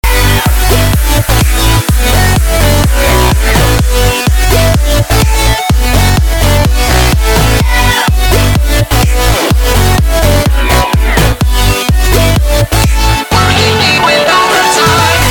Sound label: ringtone